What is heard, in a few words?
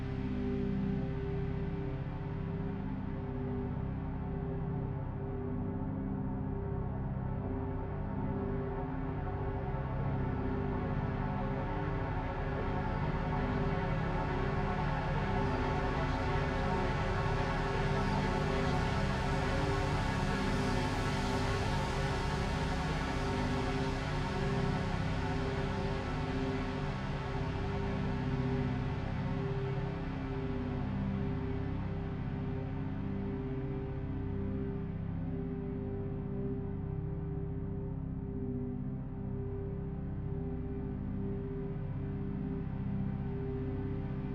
intro dialogambient loop 120bpm